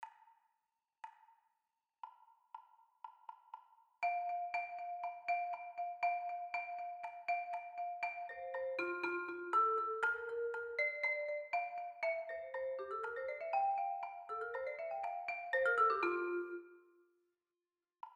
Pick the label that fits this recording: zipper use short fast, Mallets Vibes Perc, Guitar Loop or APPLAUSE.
Mallets Vibes Perc